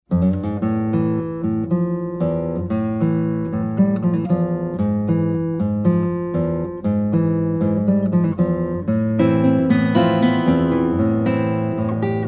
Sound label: Tango